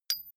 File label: metal impact tiny toppy; hits explosionen; metall